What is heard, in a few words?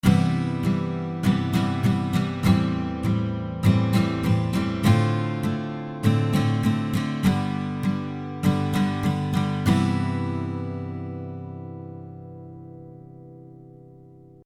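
Guitar rhythm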